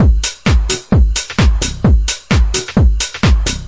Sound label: 130 bpm dark boppy house